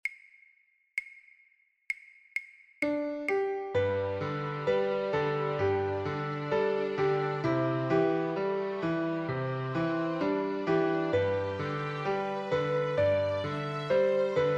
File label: Red River Valley Traditional Piano